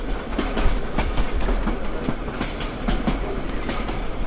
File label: Train